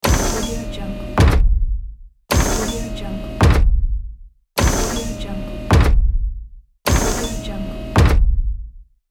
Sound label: mech robot footsteps